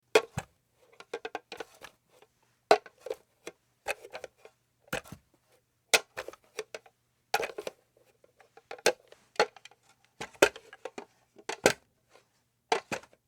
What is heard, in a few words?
wood scraps shuffling